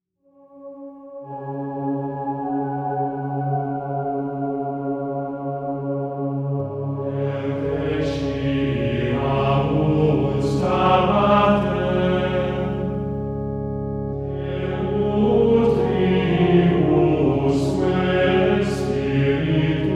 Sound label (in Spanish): gregoriano